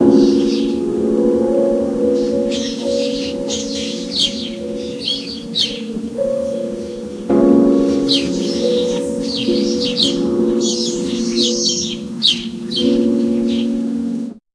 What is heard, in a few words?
the sound of piano and, birds